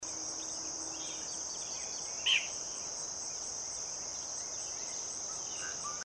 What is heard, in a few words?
Least bittern; پرنده بوتیمار کمینه